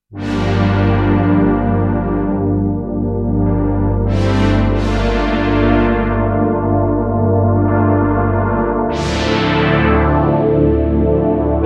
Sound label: Pad Realtime Knobs